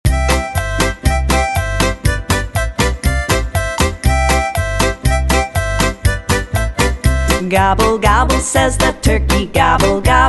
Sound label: Gobble, Gobble